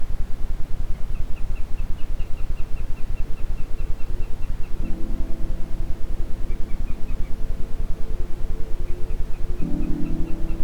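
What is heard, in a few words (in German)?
Iso Meditation Alpha Quickie mit Ambient Sound, Isochrone Töne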